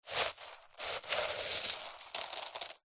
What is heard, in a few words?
nature ice crashed pieces small movements digs drops